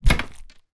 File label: pike attack